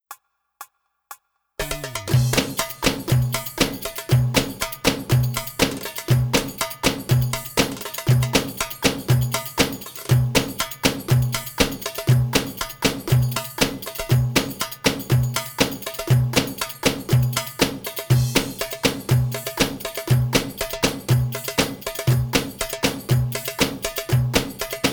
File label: Maksum Fast, bellydance music